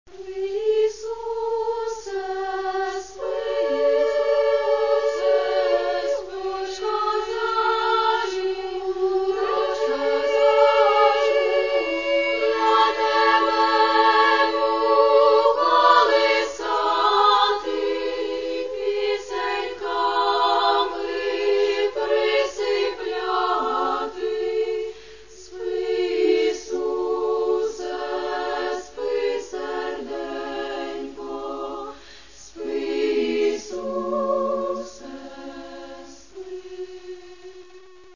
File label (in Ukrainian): koljadky i shchedrivky